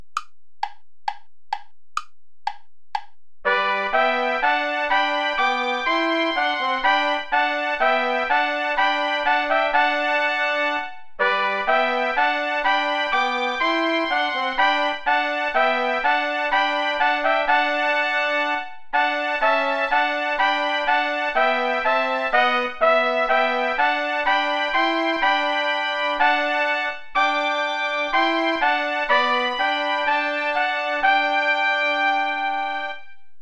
play along without trombone; schutz heinrich wohl denen die wandeln

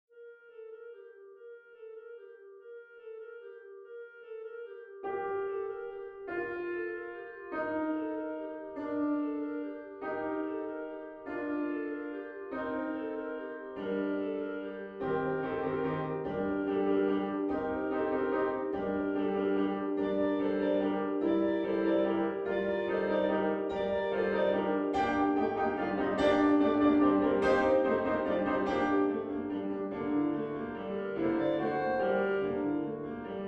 carols of the bells; leontovich mykola

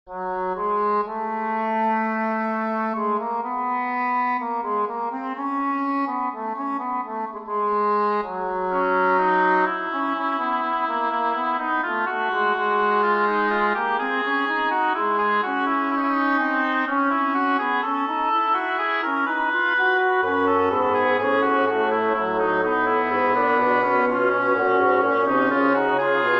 fugue minor for woodwind quartet; bach johann sebastian

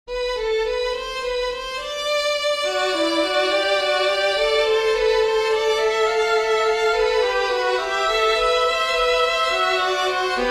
for string quartet; bach johann sebastian; fughetta kyrie gott heiliger geist

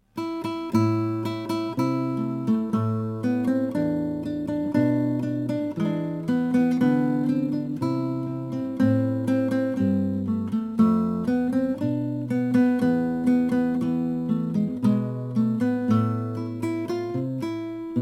maracatu

